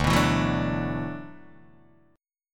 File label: D 6th Add 9th D, Guitar, Open D